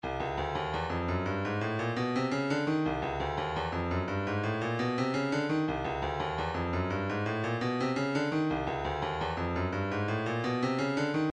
stylus percussion midi files shakers 114 shekere

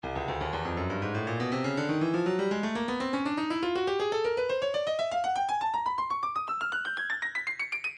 breakbeat 121 bpm; Microbrew D; Stylus Breakbeat Midi Files

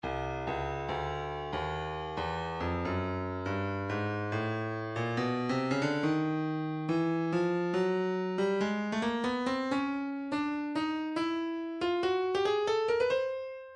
breakbeat midi 070 bpm urban tight; Stylus Breakbeat Midi Files

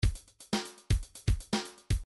funk 1bar

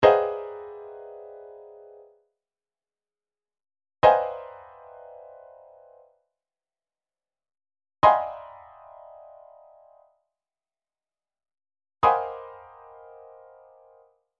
chord lines Ab, midi; Midi Files 1000

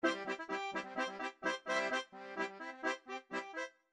chord lines polka; Midi Files 1000

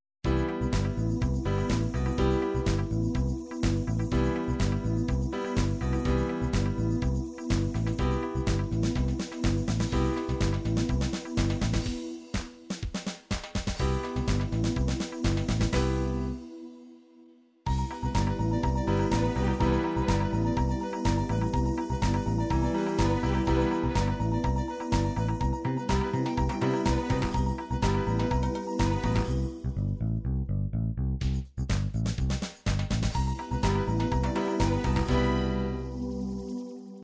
disco disco

